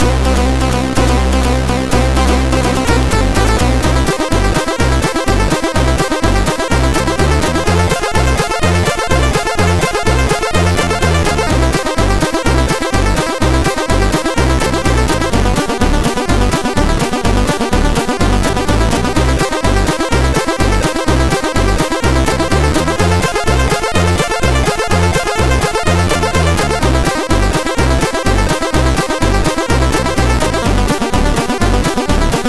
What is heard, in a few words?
Country Song, MOS6581R4